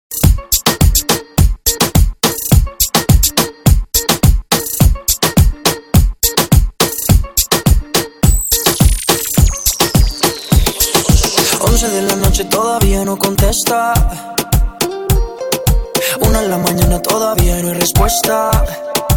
REGGAETON INTO BPM 105

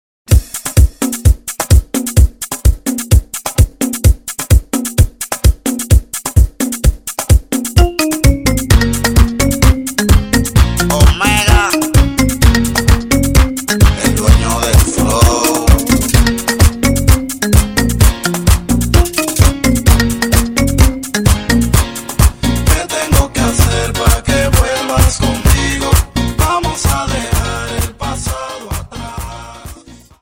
MERENGUE INTRO BPM 128

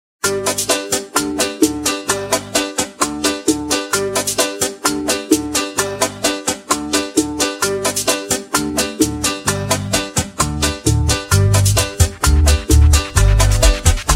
EN VIVO BACHATA INTRO BPM 130

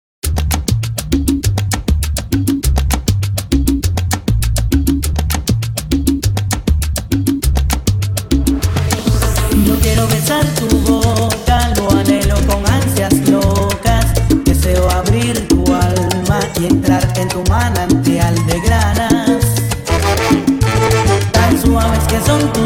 SALSA INTRO BPM 100

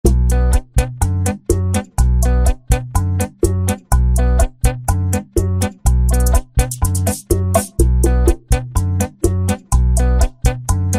BACHATA INTRO BPM 124

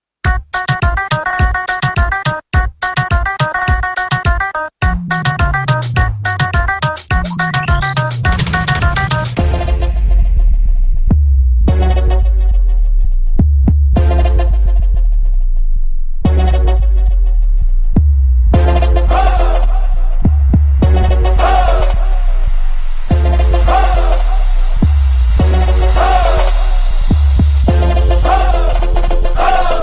REGGAETON INTRO BPM 105

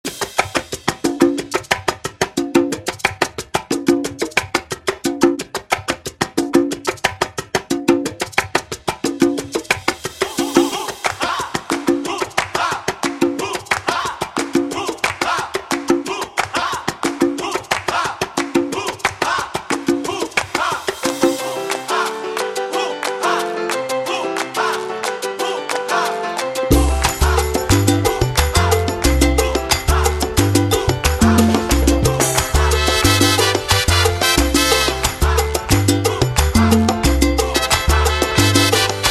SALSA INTRO BPM 90

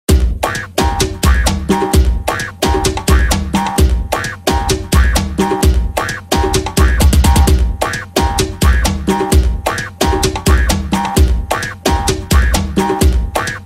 FUNKY BRAZIL INTRO BPM 130

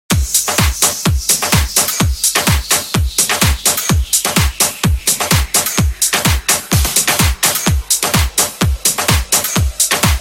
house intro bpm 127

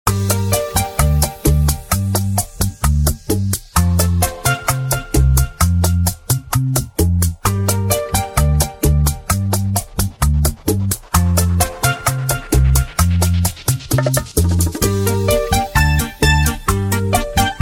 BACHATA INTRO BPM 130

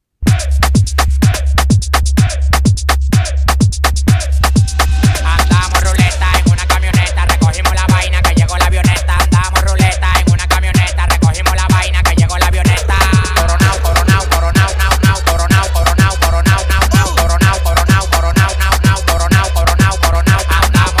DEMBOW INTRO BPM 126

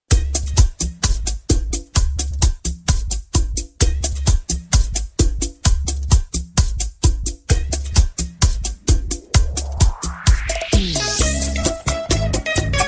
BACHATA INTRO BPM 130

